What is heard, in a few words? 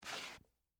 Dress Shoe Scrape, Concrete; Footsteps; BlastWave